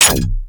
Rifle Firing Started Mech; Weapons; Warframe; Corpus; Ruban

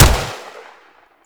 Gorgon Shot, Weapons; Warframe; Void Trader

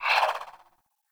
Swing, Weapons Melee; Warframe; Modular Melee

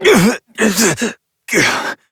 Death, Adult; New War; Operator

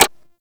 UI Time Tick Urgent; Hack Minigame; Duviri; Warframe

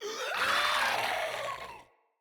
Infested Runner Explosion Warning

